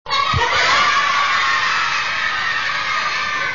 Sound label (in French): ambiance stop patatrac